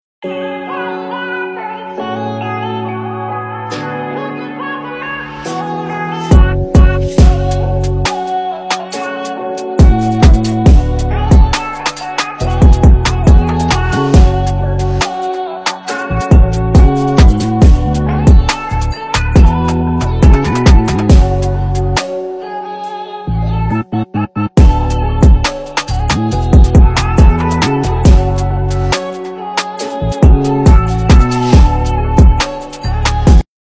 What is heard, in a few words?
Drill Trap Beat